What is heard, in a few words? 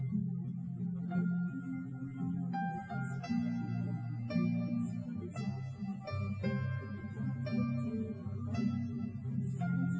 nodrums live rec; SiSEC08; SiSEC underdetermined